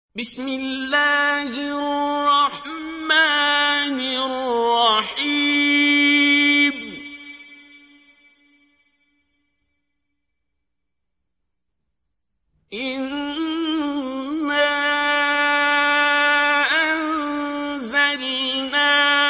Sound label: Recite, qari quran; Surah Al Qadir; Qari Abdul Basit